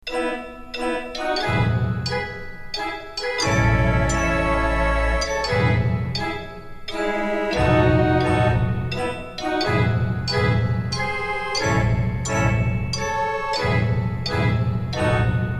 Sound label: Military March, theatre organs